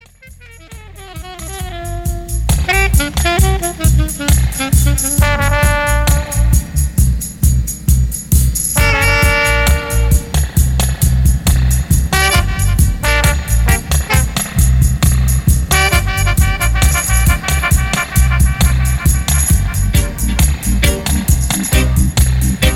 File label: Wreaking Horns Dub